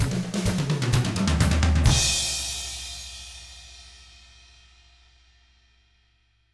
127 Drums

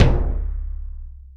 eleck tom; Greytsounds; Scott Peers Sound Engineering

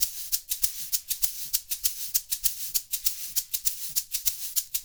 LOOP, SHAKERS, Percussion

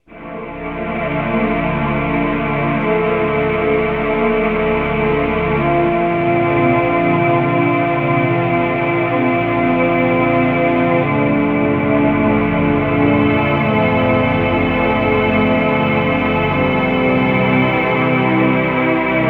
BAC, MUSICMOTIVES

